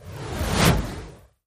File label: bonus whoosh; The Golden City